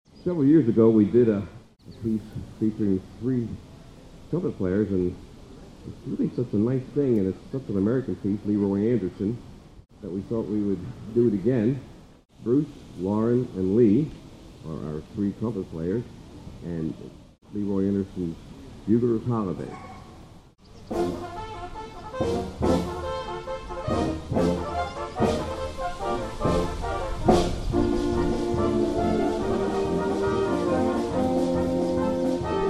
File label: buglers